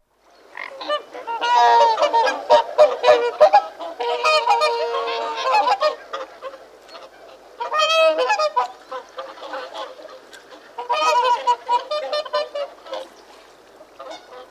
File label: Whooper swan, trumpeting calls from several while feeding on water, occasional calls from mute swans and barnacle; Bird; Geese; BBC